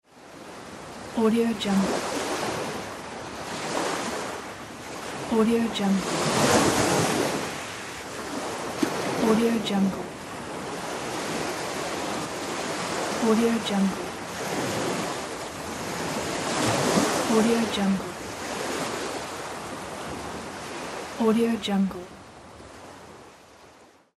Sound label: sea